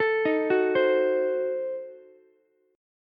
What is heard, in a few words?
F sharp Diminished 7th, Ukulele; arpeggiated; 14,15,14,15